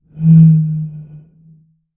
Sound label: Bottle Blown